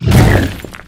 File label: zombie boss hit